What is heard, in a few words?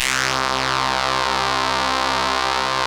700 B-CH C 1, Korg; Back In Time Records; Korg Universe VOL-1